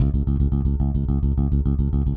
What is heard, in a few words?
Sixteenth Notes C#, Bass; Construction Kit